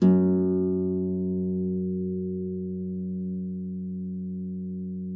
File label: F2, HARP; Xsample VOL-8; HARP P+MF